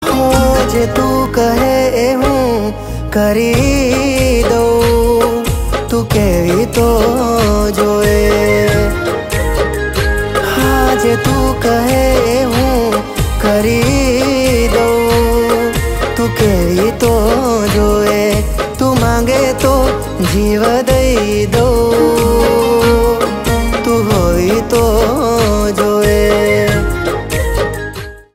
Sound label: Ringtone